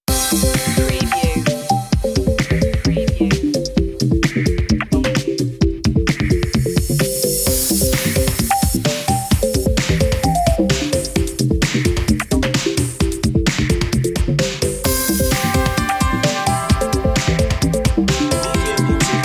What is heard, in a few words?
electro; media music now